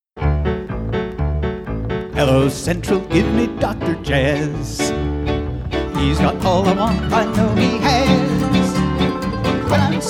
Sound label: Jazz